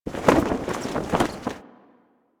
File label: Flag Flap B; Warframe; Ambience; Grineer; Grn Flag Flaps